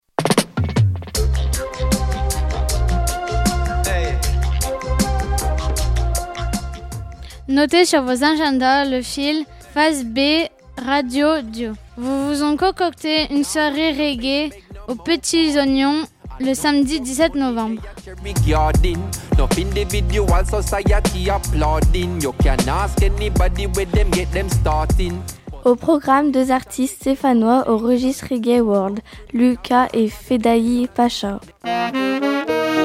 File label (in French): jingle; MJC Tilleuls; Le Fil